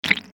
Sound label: liquid event